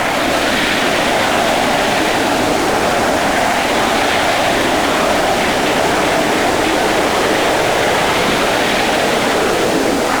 Aircrafts F-5E J85GE Around; DCS

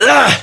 pain

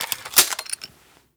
thompson insert clip; Rust

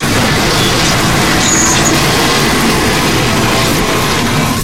scrape, tanks

